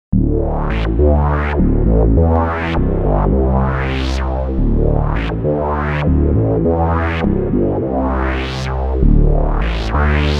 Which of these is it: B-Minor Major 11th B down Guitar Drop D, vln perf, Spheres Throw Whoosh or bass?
bass